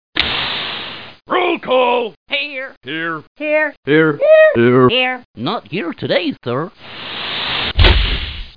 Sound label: FRIG